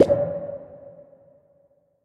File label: Boss Enemy Spawn; Avie